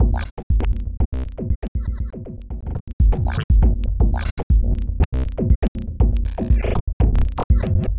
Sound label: Robot Thunder, Modular Electronica